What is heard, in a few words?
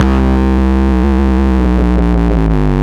Bass B dist 170 C, drum n bass 170bpm; musicradar; Basslines B